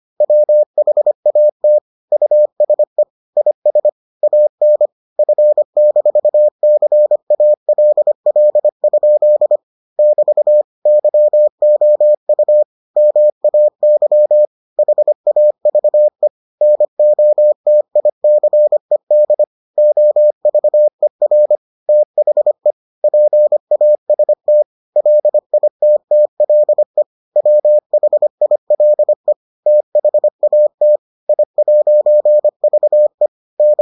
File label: morse